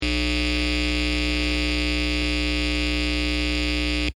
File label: Electric Buzz Steady, Electrical humming, buzzing, even; Electricity; Electrical Sound Effects; Lynne Music